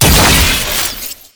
Explosive Impact; Warframe; Weapons; Acceltra Prime

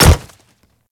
Pet Step Default; Warframe; Alad V Pet

